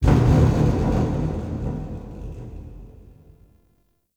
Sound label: Metal Rumbles; Warframe; The New War; Zariman